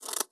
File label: cut paper